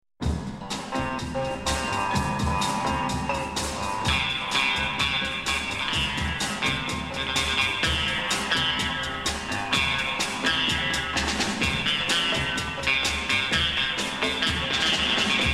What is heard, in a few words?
Karma Sitar, Electric Psychedelic Sitar